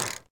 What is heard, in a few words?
Piano Version; Otogirisou